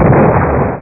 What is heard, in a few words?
explo